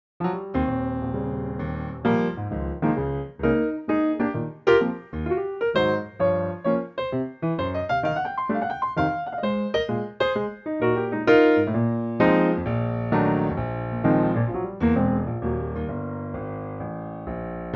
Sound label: Eb piano; Pianotrak; key - Eb; vocal range - F to G; Superb and swinging second piano only arrangement